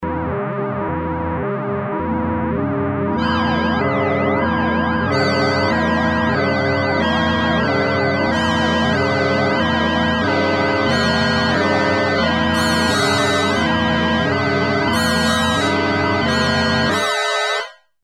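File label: sad pacman, emu carnaval; FX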